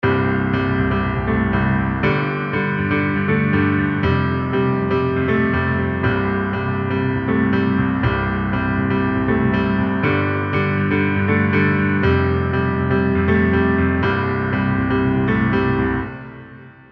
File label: EZkeys Studio Grand Synth Pop In the Big Hall